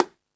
Latin Layers, Drum Machines E-MU Pro-Cussion

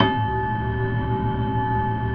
Pno Hybrid; E-MU Producer Series Vol. 5 – 3-D Audio Collection; 3DSprints; 3DPnoHybrid

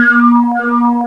PPG WAVE, OLD SYNTHS

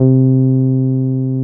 TB 303

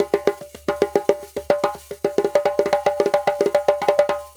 Djembe with Kessing, African

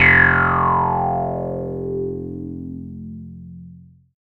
Bass, Synths, 5U Modular

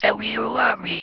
Female vocals and robo-vox

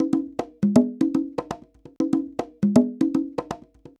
Congas 120 bpm, African Drums; Quazibeat; African Secret

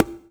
Conga; Equipped Music; Loungin House

